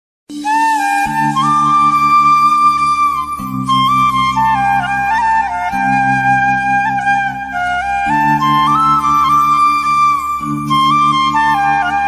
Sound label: ramayan flute ringtone krishna bansuri dhun ringtone